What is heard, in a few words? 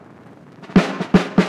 Fill - 80 BPM, Drum Loops; Drum Fills; Cymatics; Eternity Sample Pack